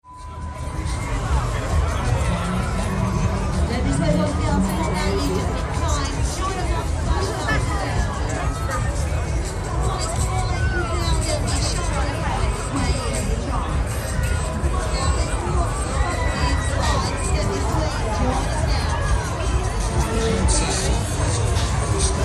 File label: Fairground Ambience